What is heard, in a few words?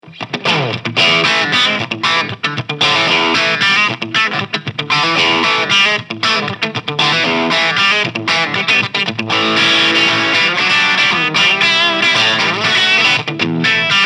Hagstrom Swede Gold Dirty Crunch